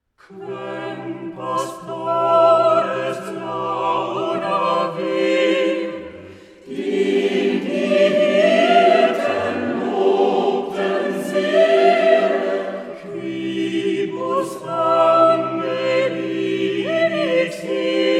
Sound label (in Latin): quem pastores laudavere